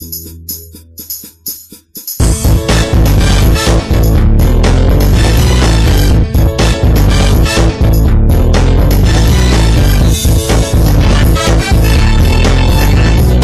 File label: Opening song; Virtua Fighter Kids